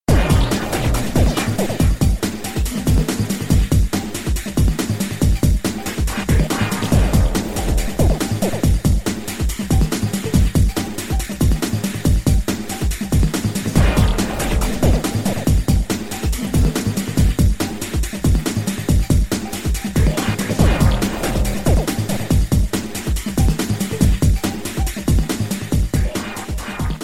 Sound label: sputter